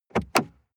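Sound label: sports car interior glove compartment open short; verkehr-transport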